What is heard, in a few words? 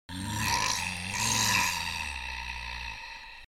zombie growl